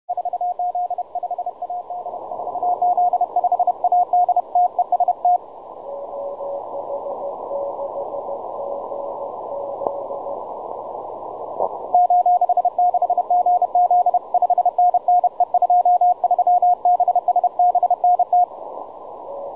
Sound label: 20m cw sample; juma trx2